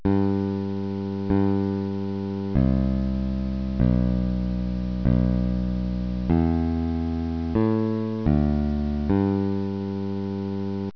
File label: bass track